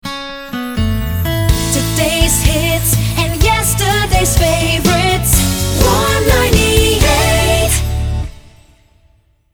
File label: Medium Hot 125bpm C Shotgun, jingle; Warm 98